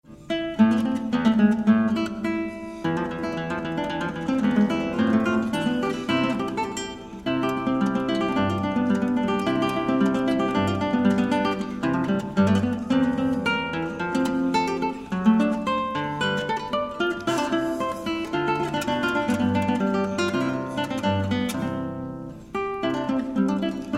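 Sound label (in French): flute anglaise live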